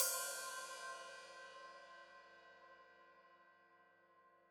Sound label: Hat Open, Hi-Hat